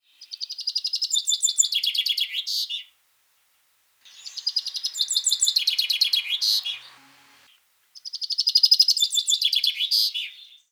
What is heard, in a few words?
Fringilla coelebs Chaffinch young